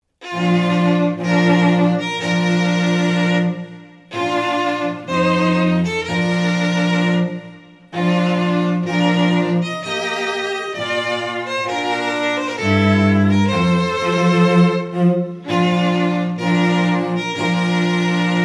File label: Bridal Chorus, strings